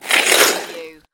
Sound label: Snow and Ice, Ice slide pass by